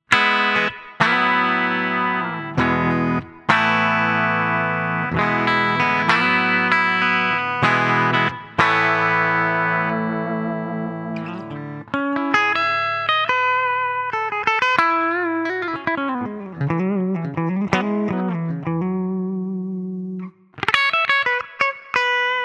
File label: Ibanez QX54QM Pos. 3 Clean